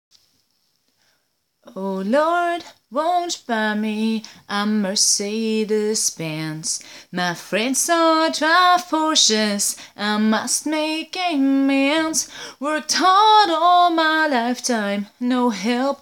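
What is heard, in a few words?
Singing, Voice Only, Female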